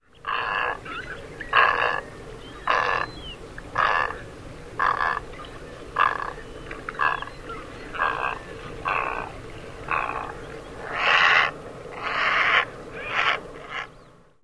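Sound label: Great Egret, croaks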